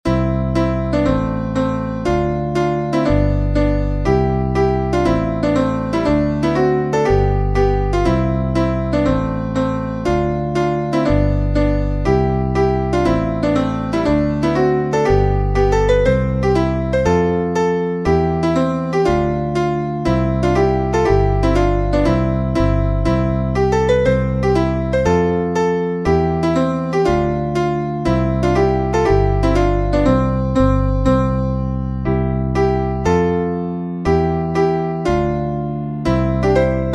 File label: Schottish